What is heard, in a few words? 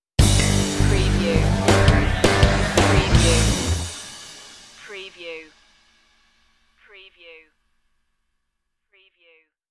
slam dunk stinger